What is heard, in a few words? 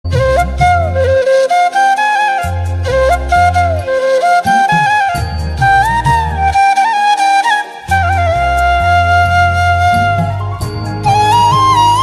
flute song; oppam